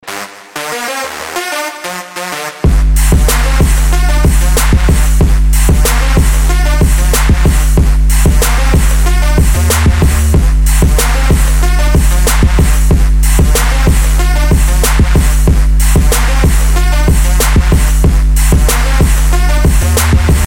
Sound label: Trap; Trap City